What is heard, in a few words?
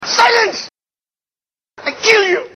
silence